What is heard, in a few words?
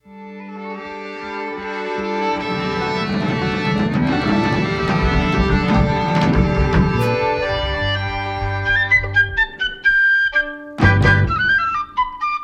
Irish Polka